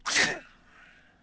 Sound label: snooze, CHURCH; AMBIENT; Pirates Of The Caribbean